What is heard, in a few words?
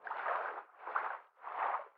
Swim Loop; Hollow Knight; Fluke Fairy Swim Loop